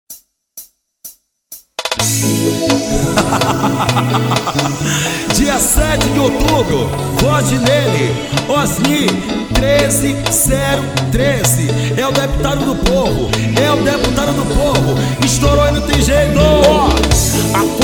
jingle pagode

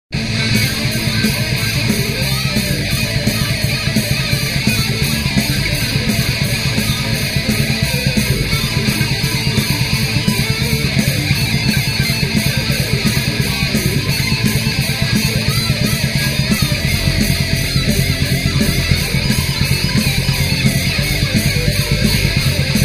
hard rock

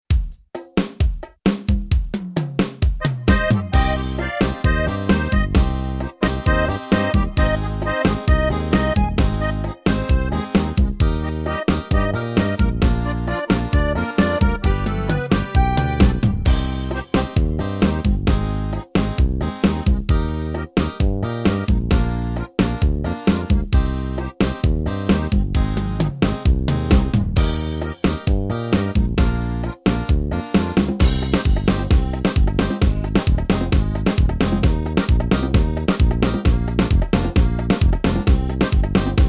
ritmos yamaha arrocha com dancante universitario

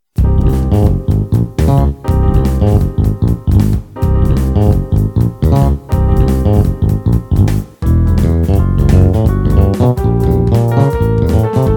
bass

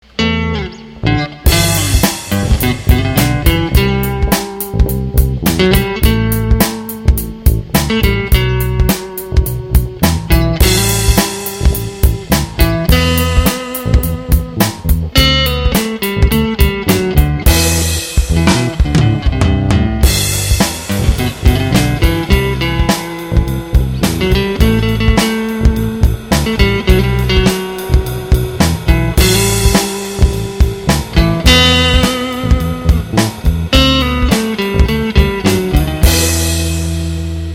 instrumental

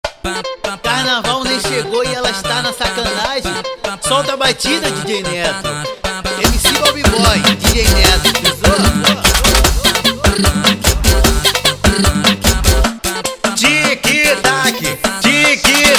Arrocha Funk; carnaval

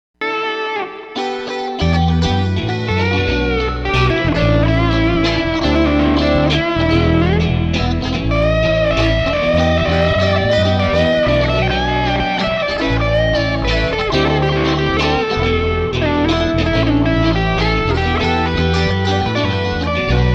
guitar balada

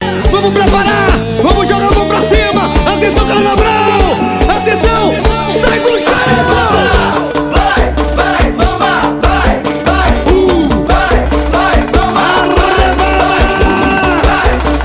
beat

